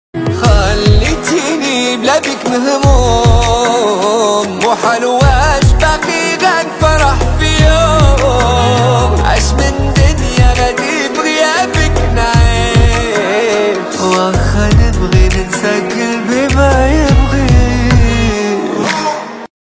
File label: Ringtone male version